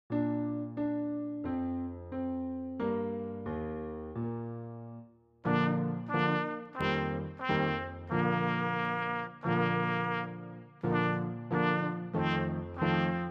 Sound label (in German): Lieder Trompete; Regenlied